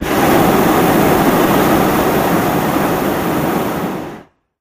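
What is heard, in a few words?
Hot Air Bllon Burn Cl, Hot Air Balloon Burner, Close; Hard On, Soft Off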